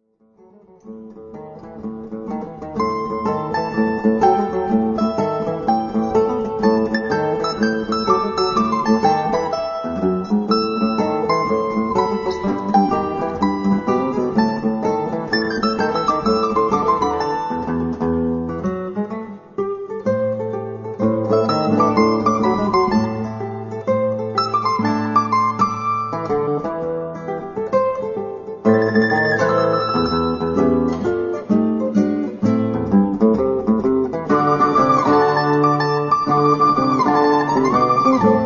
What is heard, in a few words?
Noise; Tempus Fugit